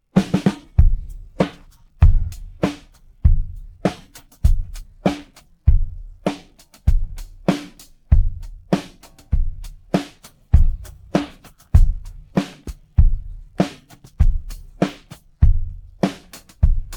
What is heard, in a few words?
drums pattern